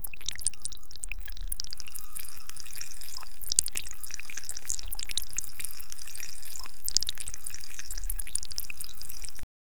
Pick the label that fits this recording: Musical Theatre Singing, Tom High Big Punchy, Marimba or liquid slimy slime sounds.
liquid slimy slime sounds